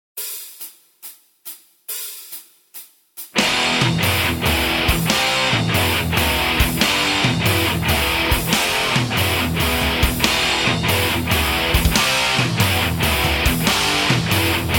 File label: bass metal, backing tracks